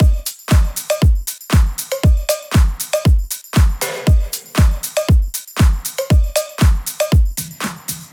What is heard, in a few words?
118 Drums Full